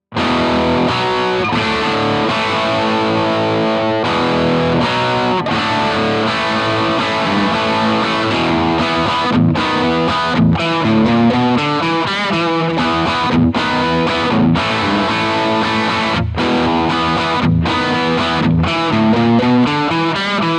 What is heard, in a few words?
rock chords; jensentone